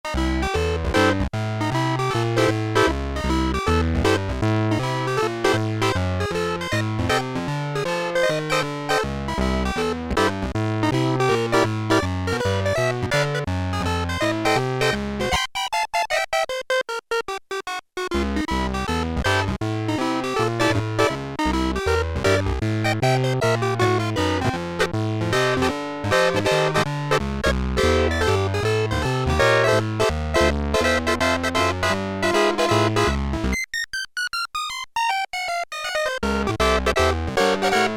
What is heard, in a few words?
SAW PIANO, Oberheim OB-8; Factory Patches